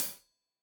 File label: Hat Tight, Hi-Hat; Deeply Sampled Drums